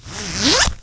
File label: zip